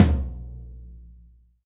big tom drum single hit b key, tom tuned to the B note. Loudest frequency: 433Hz